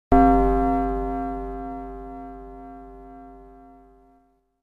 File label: Grandfather's Clock